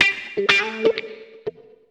125 a, guitar licks; PS-28 Vocal House Samples; instrumentloops

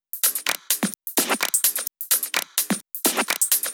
Drumloops 128BPM

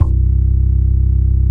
Basses C; Vengeance Samples

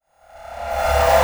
VFX Short Fills & Reverse

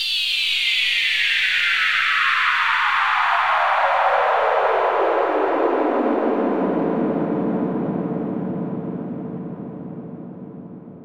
Downlifter

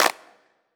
Snares & Claps; Vengeance Samples

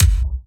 Sub Perc, Bassdrums; Vengeance Samples

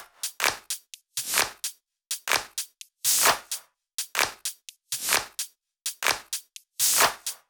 128BPM Brazil Kit; Vengeance Samples

